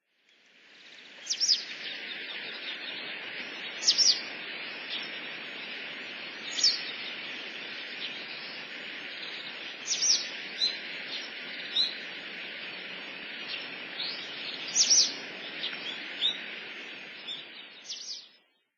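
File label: White Wagtail; hitachi; koe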